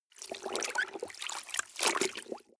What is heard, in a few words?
clothes water wring medium